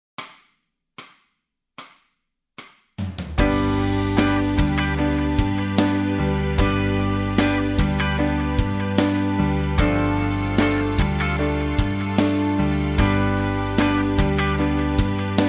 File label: guitar scales, backing tracks